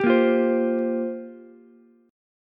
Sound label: G Minor 11th G down Ukulele C6; Gm11 strummed; JGuitar